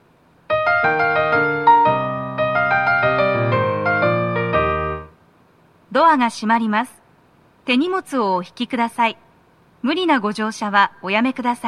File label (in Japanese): 発車サイン音, ピアノ; 1番線 代々木上原・唐木田・伊勢原方面 発車サイン音; tokyometro chiyodaline nogizaka; 1番線がイントロ